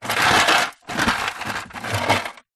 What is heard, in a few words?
household freezer ice cube plastic tray open then close; zapsplat